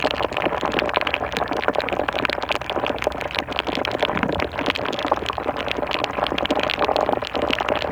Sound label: Tail Loop; Ambience; Props; Kuva Trocher; Warframe; TheNewWar; Zariman